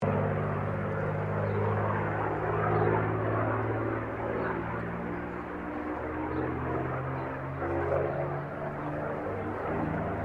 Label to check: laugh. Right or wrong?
Wrong